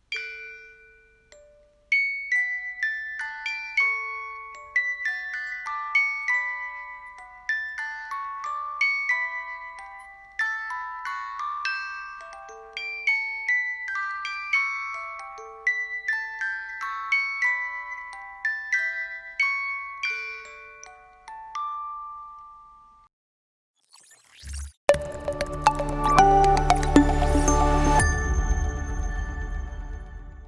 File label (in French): boite a musique manivelle